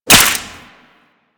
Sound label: Whip Impact Default, weapons; Grn Whip